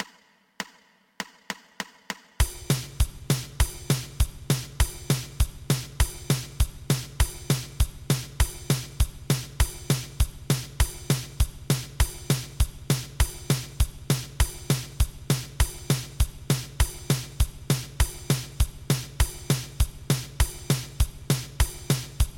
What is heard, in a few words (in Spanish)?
2 pulsos